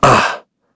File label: Vox Damage; en; voices; heroes; Shakmeh; kings raid